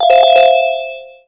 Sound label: win